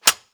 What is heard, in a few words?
charger forward